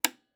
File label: Switch; Interface and Item Sounds; Switches Buttons